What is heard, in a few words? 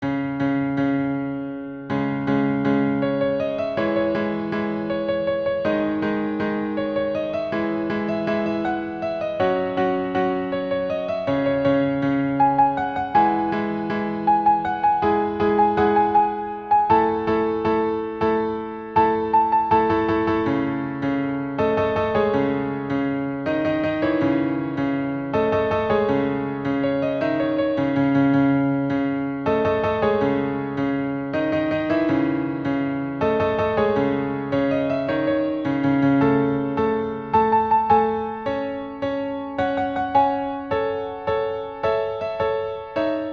Piano Cover